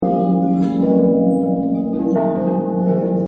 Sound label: Buddhist Bell Amb Loop; Bells; Musical